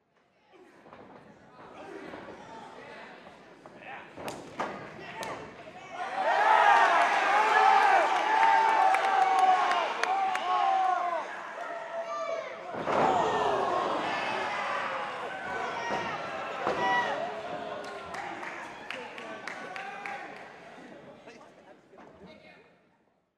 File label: wrestling match with crowd